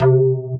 C Synth; M8; Electronisounds; Enigma Dub Cube One Shots

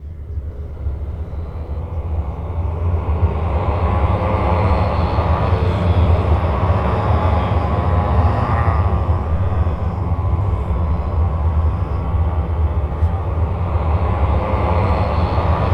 GUTTERAL, Voices

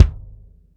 BD, Bassdrums, Roland TD-20

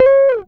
GTR TRIK, Jazzistic; Univers Sons

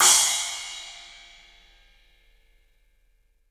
CYMBALS; 3D Sound; 3D Drums

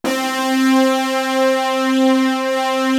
FAT BRASS; East West; Global Sounds; VFX-SD

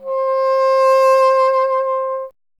WND BSN C, Bassoon; Miroslav Vitous - Solo Instruments VOL-1

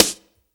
AMBIENT, Hip Hop Kit; E-MU Formula 4000 Series Vol. 1; Hip Hop Nation; Hip Hop Kit 2

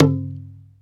bata; AKAI S-Series CD-ROM Sound Library VOL-5

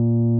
RHODES B1; Sonic Reality - Vintage Timetraveler

